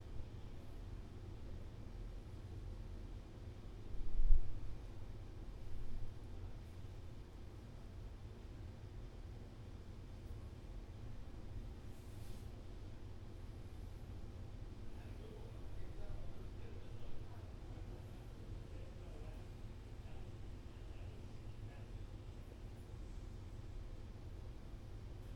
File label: Hall Ambiance